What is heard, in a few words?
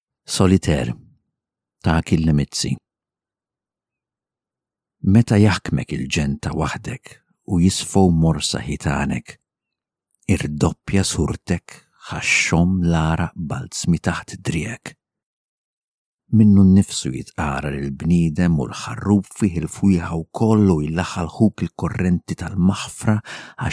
Taqsima